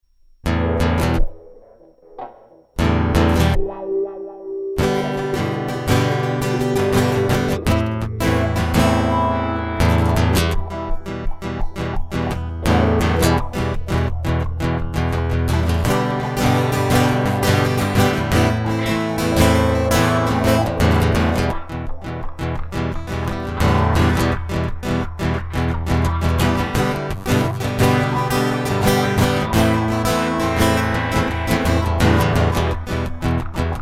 Electric Guitar Interlude

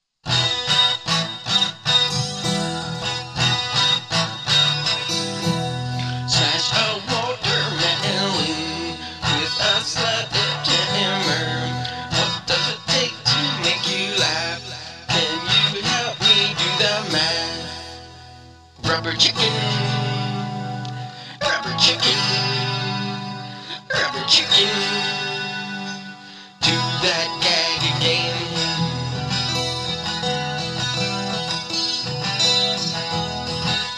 Soundcheck

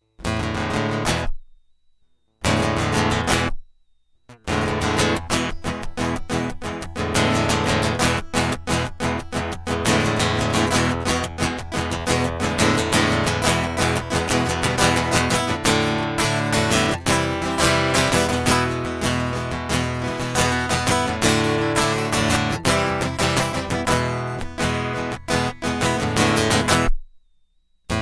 Guitar Loop

